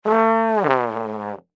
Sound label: Cartoon Trombone Slide Descend Musical; Cartoons; Blastwave